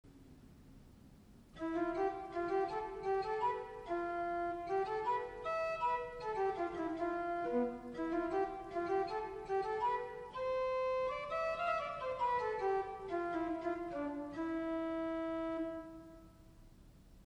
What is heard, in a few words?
Tune Phrygian